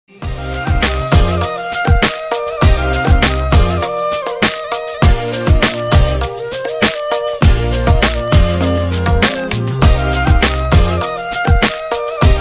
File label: hiphop flute; ringtones